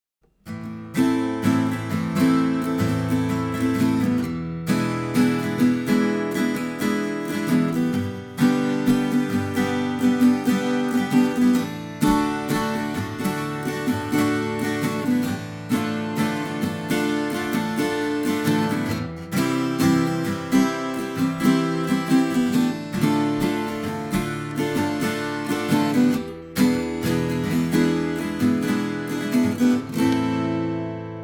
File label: 2 Guitars, Nashville Tuning